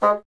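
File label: wind bassoon G; Miroslav Vitous - Solo Instruments VOL-1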